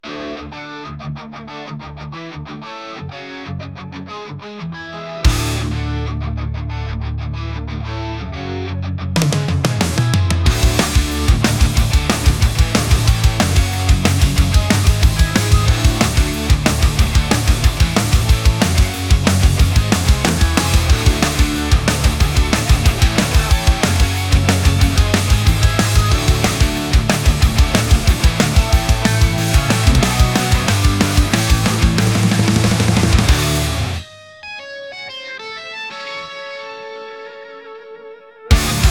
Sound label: sin victory; Virtue Slots